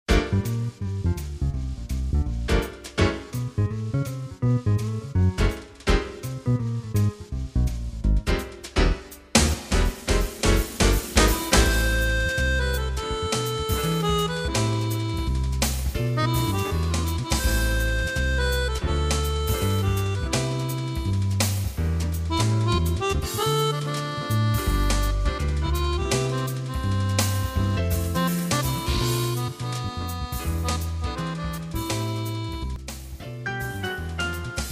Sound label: piano